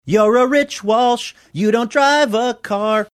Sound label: singing you rea rich walsh